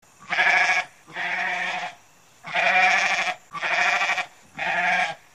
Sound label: Goat Sounds Car Horn